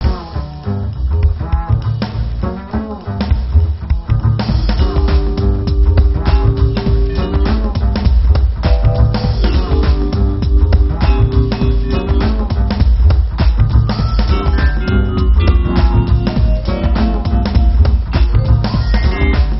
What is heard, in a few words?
vibe